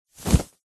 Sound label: foley clothing jumper fast short movement flap; zapsplat